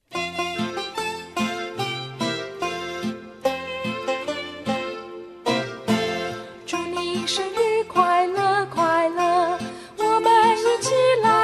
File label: words and music, Chinese; childrens songbook